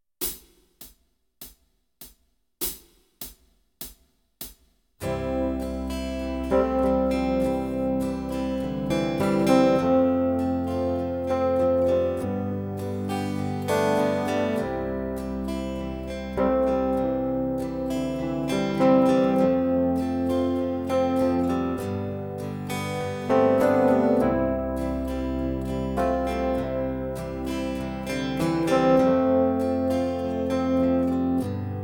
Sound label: (Backing track), keyboard; Kenneth Baker - The complete keyboard player